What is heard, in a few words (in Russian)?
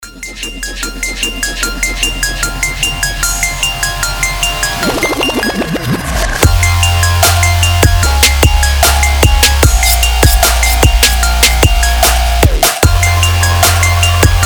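sumassedsij zvonok, crazy ringtone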